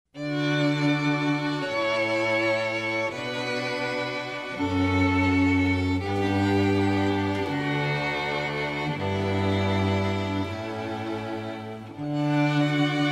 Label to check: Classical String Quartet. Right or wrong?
Right